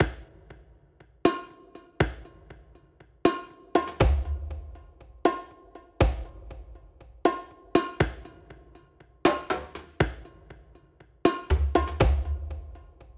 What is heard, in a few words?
perc